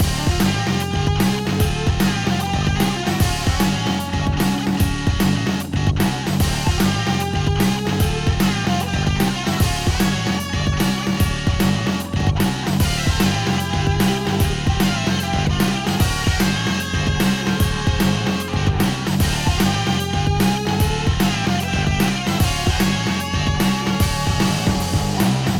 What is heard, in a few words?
forest boss loop; bgm